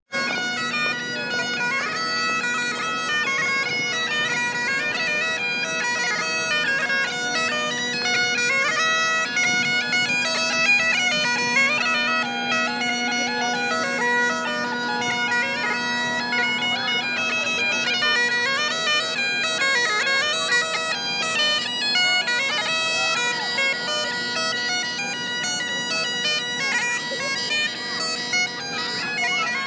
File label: bagpipe